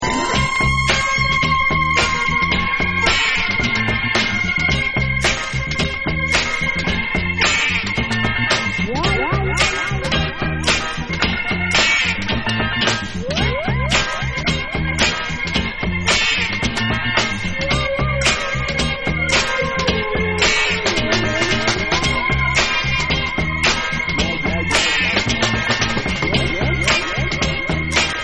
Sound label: Moog